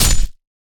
sword hit

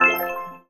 arcade beep

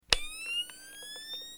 camera flash charging

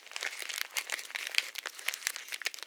paper rustle

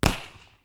distant shotgun

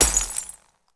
glass smash

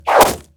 arrow hit

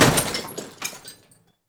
kitchen dishes break

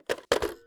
rotary phone hang up

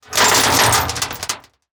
nails drop metal board

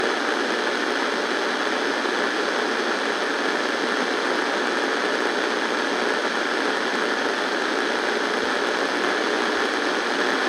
radio static

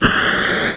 FLAME JET

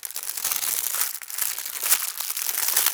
paper crumple

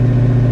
diesel engine gen pow

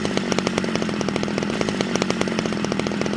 Chainsaw Idle

